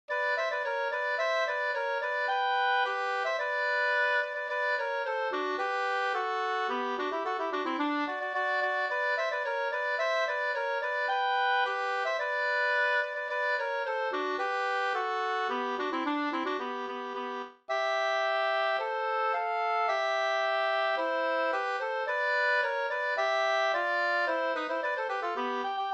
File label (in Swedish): polka; Anglapolketten 2st